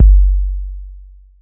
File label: BD 808